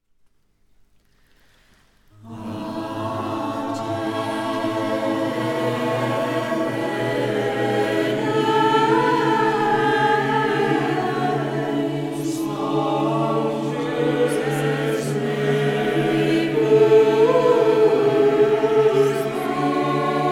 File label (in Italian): Coro Polifonico, Oboe; Armonie dall Oratorio di Santa Caterina